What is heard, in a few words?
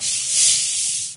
chatter, wraith; doom3